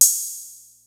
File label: MEGA KIT, DRUMS; Kid Nepro; Elite